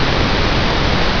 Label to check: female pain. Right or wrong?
Right